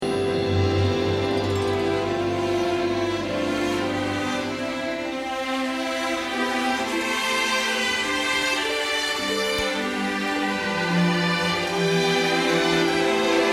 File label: strings